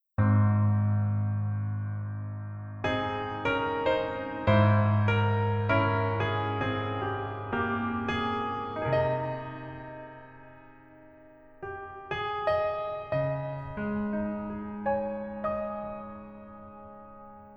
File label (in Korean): Piano ver, 멜로디MR, 여자키